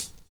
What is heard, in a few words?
STONESNAR; Univers Sons